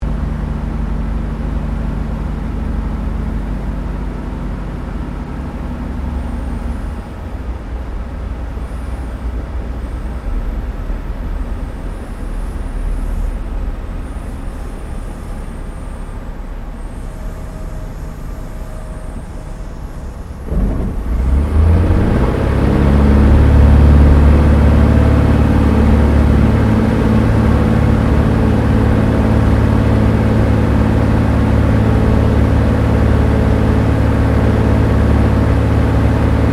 AIRCRAFT CESSNA O-1 BIRDDOG TOUCH AND GO INTERIOR; Cessna Birddog spotter aircraft; Airplanes

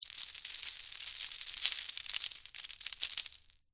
Debris Penne Rigate; Texture; BlastWave

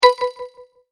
multimedia rollover, Multimedia Rollover Tone

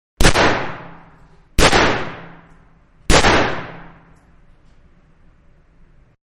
Guns Magnum 357 Revolver; 4 Inch; Three Shots In A Row; Manhattan Production Music

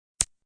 Blip; Beeps; multimedia

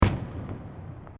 Fireworks Single Explosion; Fireworks (Sound Effects:Backgrounds)

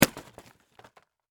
Industry Hammer Impact Dry Wall; Hammers; Tools

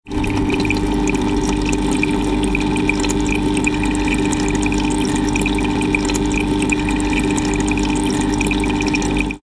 household fishtank filter pump operating; Internal Fish Tank / Aquarium Filter Buzz / Drone With Light Trickling Of Water; Miscellaneous Household; McKinney Sound

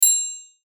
chime bell ding; Multimedia; Chimes; Blastwave

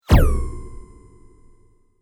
Sweep Mechanical Fast

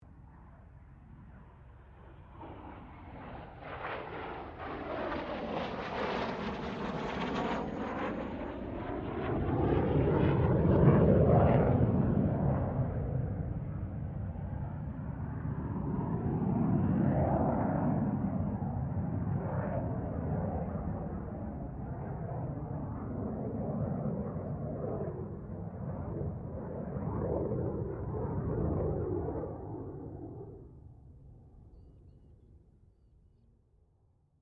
AIRCRAFT CA-27 SABRE PASS DISTANT; CA-27/F-86 Sabre; Airplanes; Vehicles

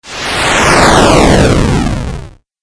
8 Bit Style Fireball; Video Game Sound Effects

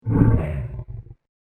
Dinosaur Beast; Dinosaur Sound Effects; animal; fantasy; Andrew Fleming

